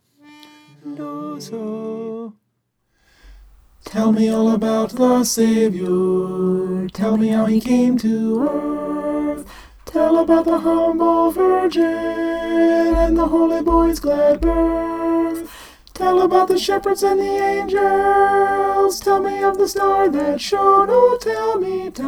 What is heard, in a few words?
alto